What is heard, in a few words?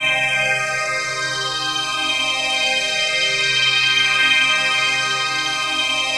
PHASE PAD, ATMO PADS; Best Service; XX Large